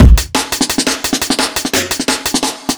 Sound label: E Beat, Electronic Beats